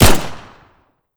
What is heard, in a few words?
pistol fire